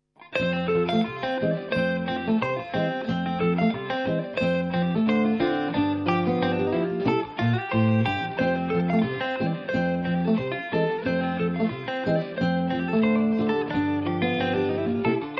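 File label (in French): Petit picking Rag de guito trés sympa ... une guitare (Strat SRV) avec un accordage en Fa La Re Sol Do Fa et un jeu en corde à vide; Backing track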